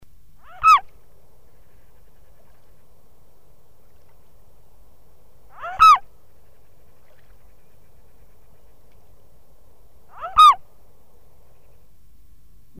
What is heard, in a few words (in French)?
Cygne, Oiseaux; Sons Oiseaux